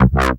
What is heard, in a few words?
DAGGA BAD NOTE, bass